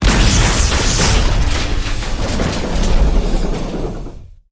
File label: robotboss death, monster